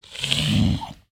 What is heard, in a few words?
zombie pig angry; Minecraft; Votive World